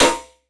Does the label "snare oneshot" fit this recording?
Yes